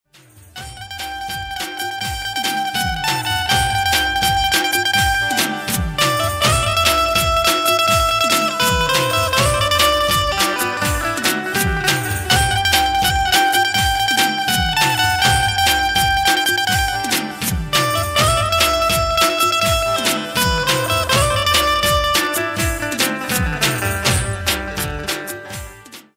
gujarati garba ringtone benjo ringtone navratri garba ringtone banjo ringtone